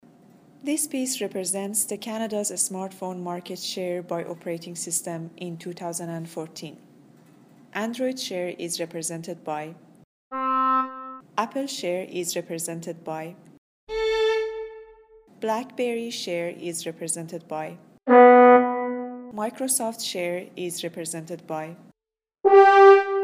Sonification Pie Chart Legend ordered no bell

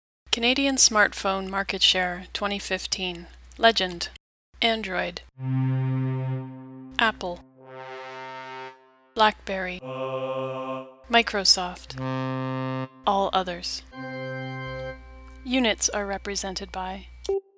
sonification stack R units timbre only

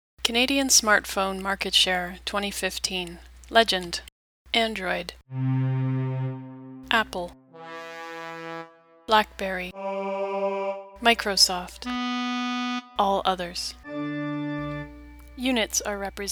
sonification pitch timbre

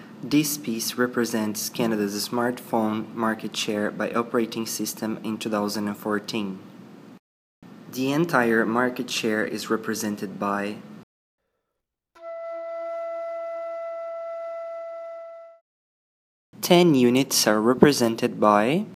Sonification

